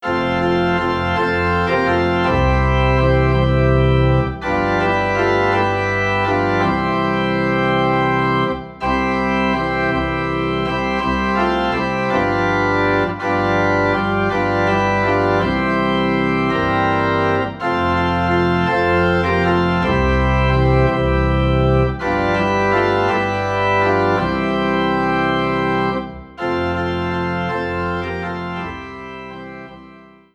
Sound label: Organ Low in C